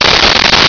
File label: welding torch